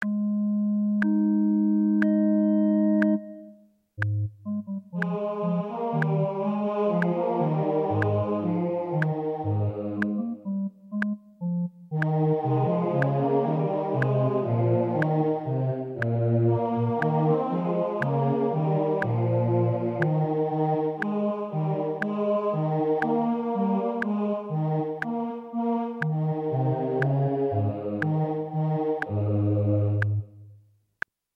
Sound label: O clap your hands; Gibbons